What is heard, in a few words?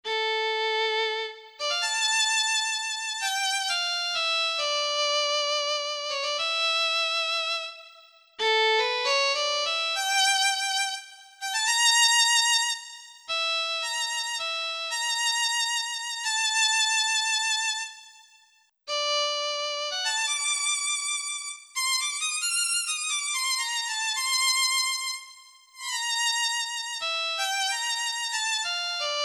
Quartet